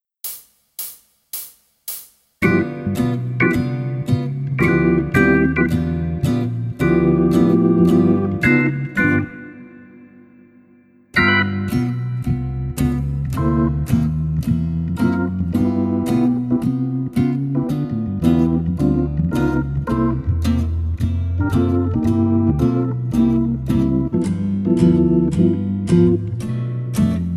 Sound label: drum set play along, blues, jazz